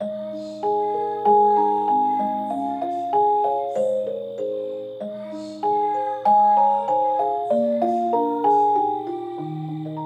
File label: (Instrumental); My Little Pony OST Repository; Season 1; Bonus Music